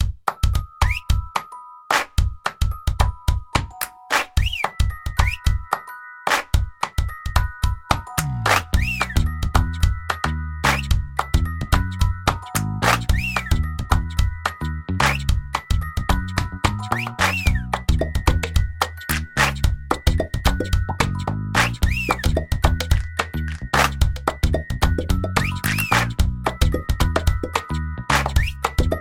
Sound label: Drums and other such noises from GarageBand, the rest from hardware synths; OSX